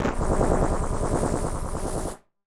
Casio, Glitched, malfunctioning synth

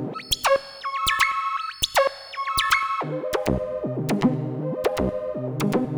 Synth Pattern; Patterns Progressions; Electrocution

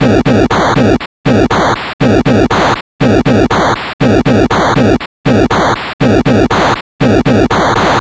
Atari 2600 Noise Drums; 8-Bit; Chiptune

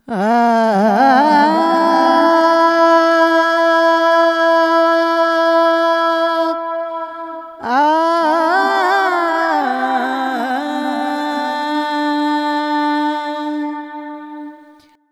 Vocals Male, World Pop; Sonic Foundry (Sony Creative Software)

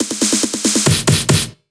Fills, Loops 140 BPM

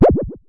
Synare Filter; Star Instruments Synare 3

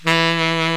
SAX COMBO

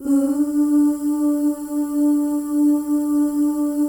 FEM OOS, Symphony of Voices; Spectrasonics

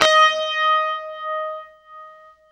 UNIVIBE D#4, Guitar; East West; Steve Stevens Guitar Samples Collection

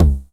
909 TOMS

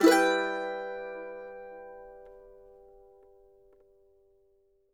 mando min; Sampleheads; Whole Lotta Country 1 & 2

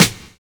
HORSE BEA; Univers Sons

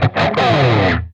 PRS SLIDE, guitar

